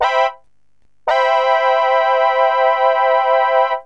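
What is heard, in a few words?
major 7th, synth chime; cubase; CUBASIS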